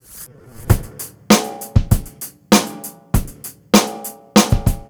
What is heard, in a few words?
BEAT R, Roots Funk; USB Soundscan vol.41 - Roots Funk; AKAI